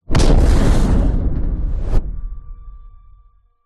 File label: horror impact dark agressive droning resonate hit metallic